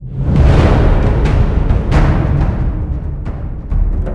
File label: combat; Backstab; Android iOS gamerip 2011